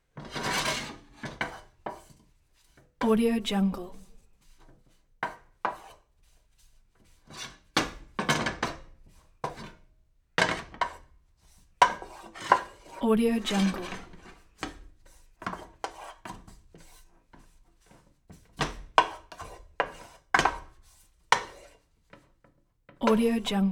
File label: pan actions with wood spoon; collanderson